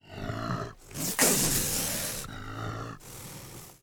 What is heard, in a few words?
angry badger